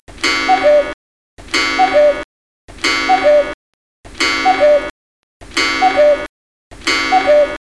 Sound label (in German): kuckuck